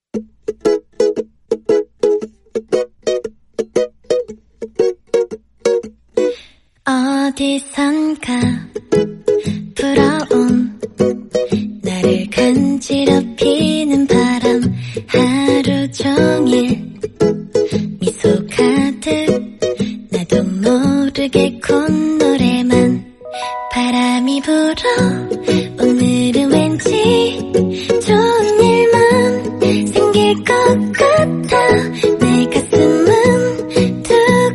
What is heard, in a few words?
Korean Song